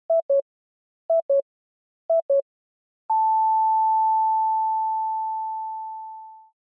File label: Time Signal Beep Long